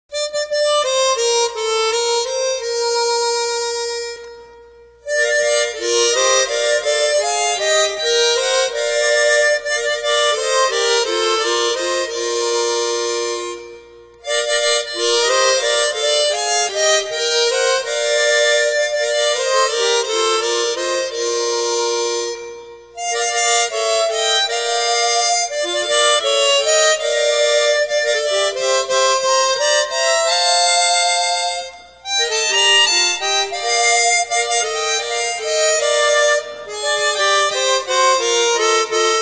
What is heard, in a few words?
Hymns On Chromatic Harmonica; Nailed To The Cross